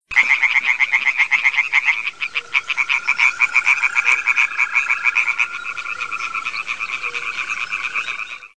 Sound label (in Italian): rana; test riconoscimento versi animali